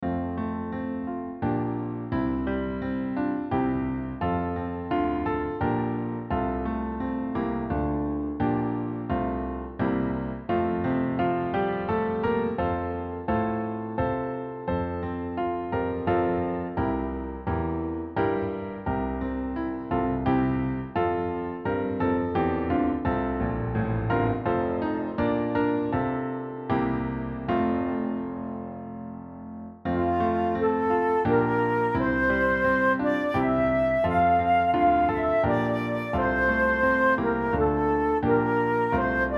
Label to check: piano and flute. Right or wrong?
Right